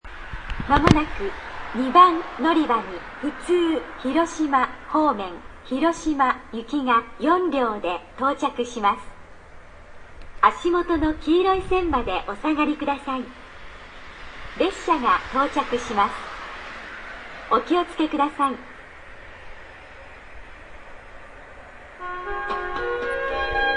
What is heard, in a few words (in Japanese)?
tenjingawa sekkin, ekihousou; sanyo-line